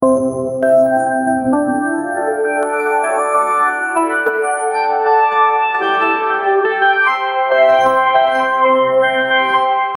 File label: jackpot machine; slots